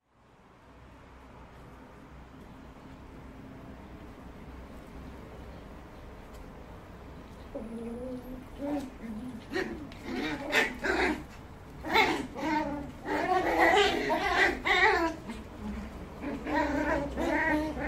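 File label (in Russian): щенки шпица играют между собой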